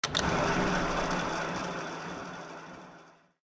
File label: spin; Party Night; slot